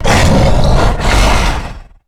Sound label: Exertion Heavy; Evolve; Goliath